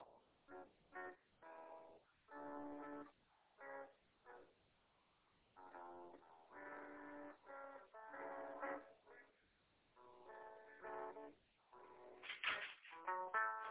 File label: Jazzy twinkle